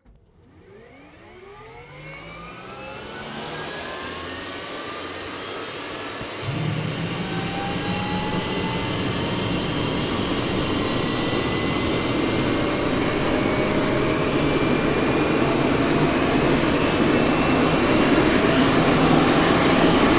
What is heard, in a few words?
engine startup exterior; FGUK